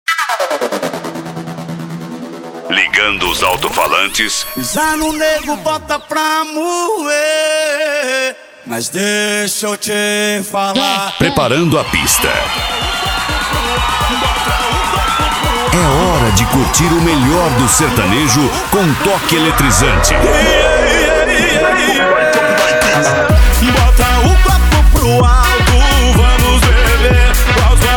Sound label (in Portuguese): Sertanejo Remix; CD Especial Sertanejo Remix Volume 03